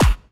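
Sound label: snare drum bass drum, Dance Mega Drums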